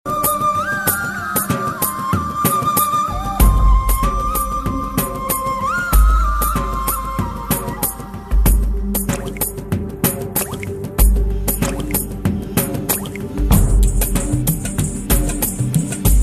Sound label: Taal Flute